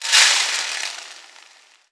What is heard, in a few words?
grain; El Rey Arturo